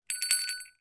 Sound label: bell small decorative bell ring